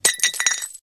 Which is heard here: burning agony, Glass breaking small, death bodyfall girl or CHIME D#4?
Glass breaking small